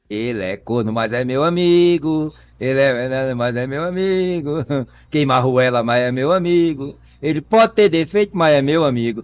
cantando

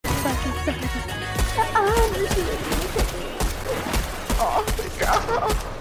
gritoooo

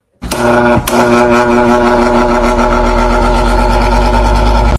barulho de ventilador

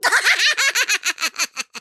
risada cartoon

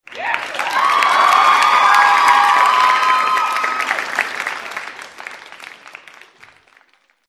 efeitos aplausos

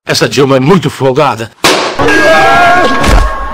caixotada; chaves perdido

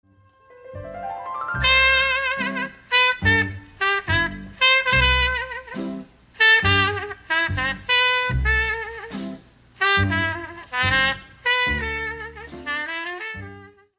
trompetista

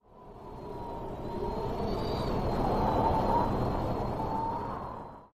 vento forte rapido

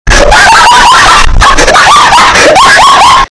risada do cachorro

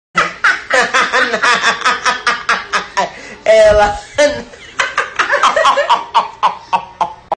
risada

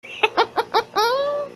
risadinha

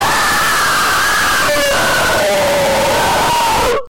grito susto

